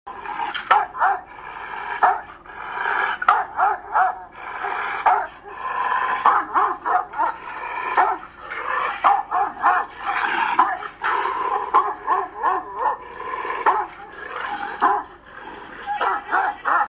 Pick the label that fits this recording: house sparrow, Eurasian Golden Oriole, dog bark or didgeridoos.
dog bark